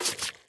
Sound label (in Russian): Звук на экране выбора карт